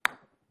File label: Golf Putt